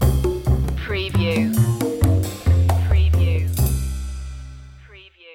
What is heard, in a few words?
jazzy opener stinger